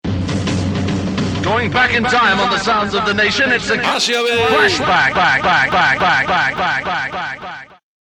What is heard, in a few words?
jingle Flashback; Game Songs